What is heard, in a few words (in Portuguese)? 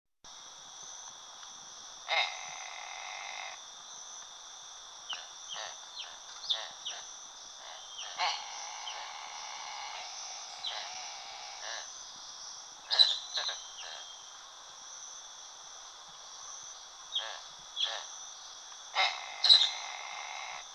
Gaviao-belo; sescpantanal